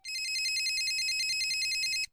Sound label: motorola startac ring style ringtone